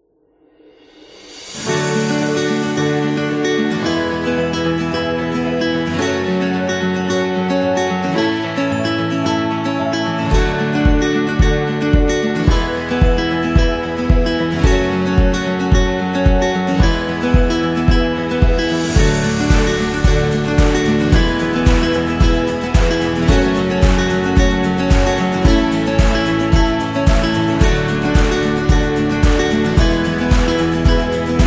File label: Country Inspiring, Happy and cheerful background music for celebration videos, birthdays